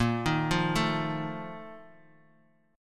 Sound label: A sharp Major 7th Suspended 4th